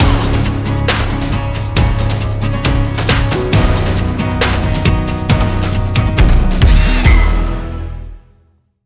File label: Template 136bpm; Wuerth